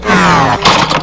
clunk

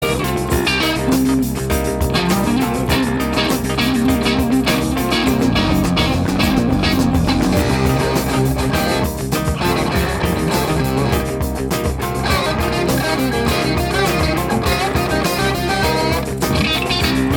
Jingle Bells

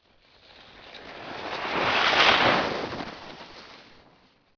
car drive by

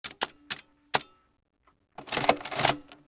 adding machine

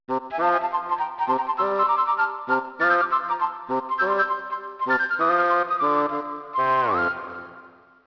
happy go lucky music, Stock Music

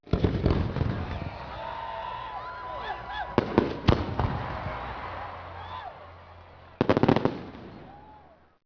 fireworks cheering

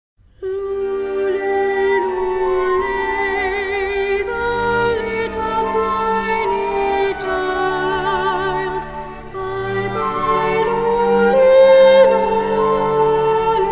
Celtic Christmas The Coventry Carol

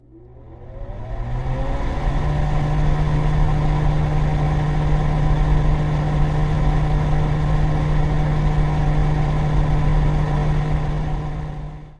Fan Startup

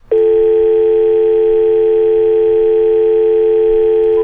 Dial Tone

